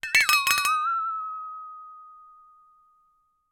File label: flexatone quiver; sonniss gdc